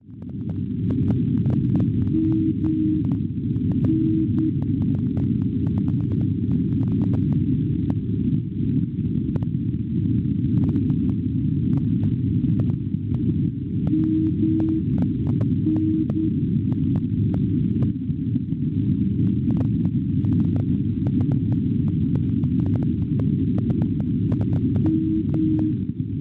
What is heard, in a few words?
NDB Menorca Baleari 344 kHz; MN; Schwarzsee